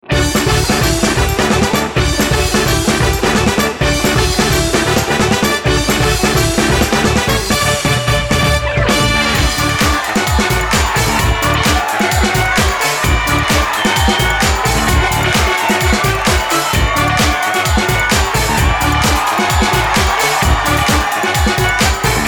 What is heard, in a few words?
Win Celebration Music